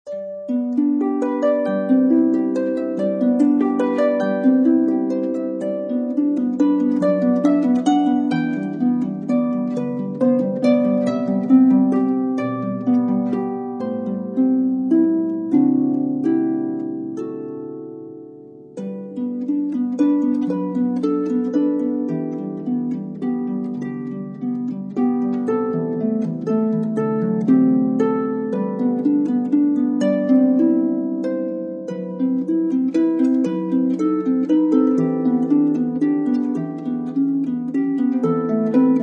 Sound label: Oh, How I Love Jesus, Harp Hymns